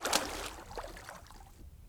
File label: Personnel Footsteps Water; DCS